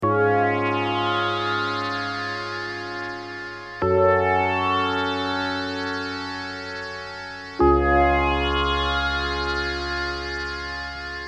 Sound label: ringtone